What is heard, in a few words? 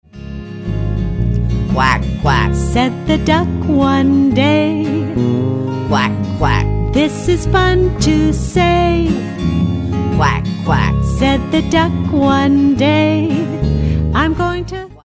Quack Quack